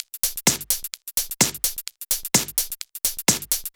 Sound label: Drumloops 128BPM